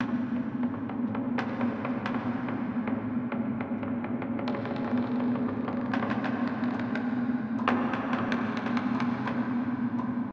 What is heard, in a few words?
eerie; Golden Crayon Sounds